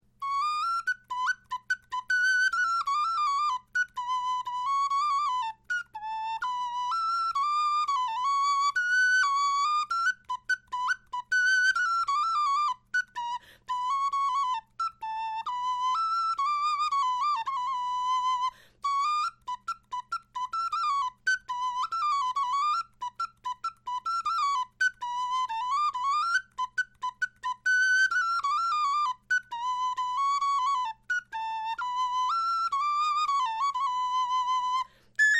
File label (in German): melodie, knochenfloeten